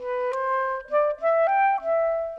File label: Clarinet; Sonic Foundry (Sony Creative Software); Orchestral VOL-2